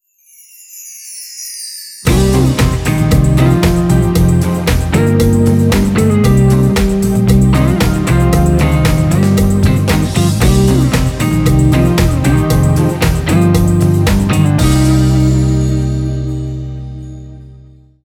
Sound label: red bossa